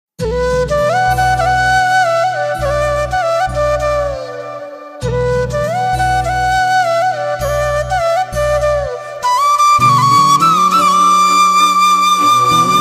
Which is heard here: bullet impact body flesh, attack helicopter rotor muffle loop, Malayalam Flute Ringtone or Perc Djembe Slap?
Malayalam Flute Ringtone